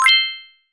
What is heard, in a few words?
bells upward, rewards